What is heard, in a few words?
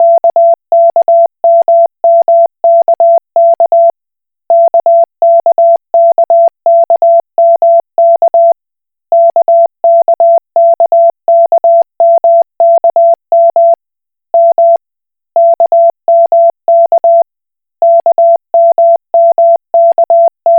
Koch Morse